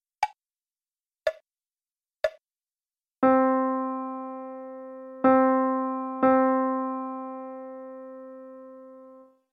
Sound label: rhythms